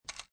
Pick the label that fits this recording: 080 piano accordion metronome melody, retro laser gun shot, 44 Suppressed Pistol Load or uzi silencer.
44 Suppressed Pistol Load